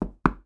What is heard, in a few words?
Door Knock Door Twice; MinorHallutinationsSounds; SFX Producer